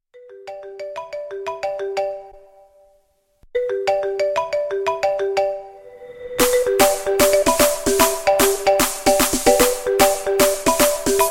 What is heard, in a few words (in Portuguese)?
toque do iphone remix; toques para celular